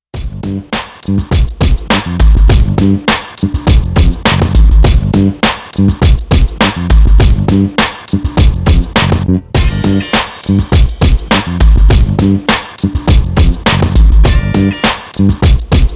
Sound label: Inst